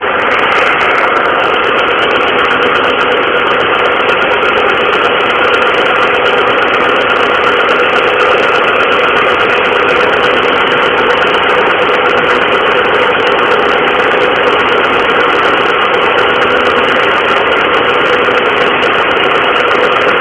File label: ticking chattering noise from motor